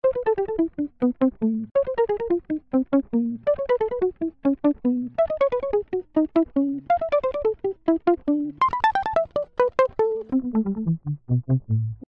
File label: Jazz Guitar; Ueberschall Jazz Guitar